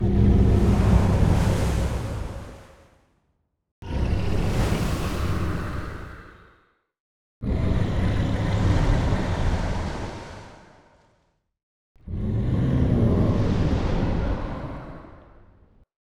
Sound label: Death; Zullra